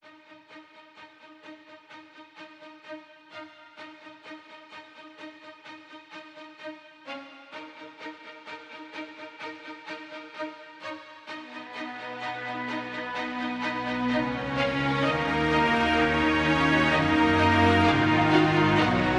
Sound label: Orchestral